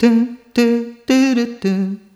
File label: Rhythmic G